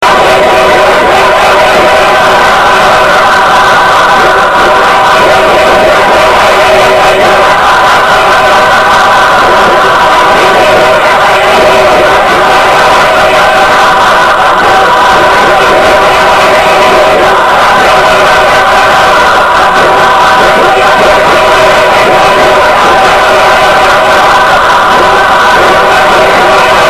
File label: NOISY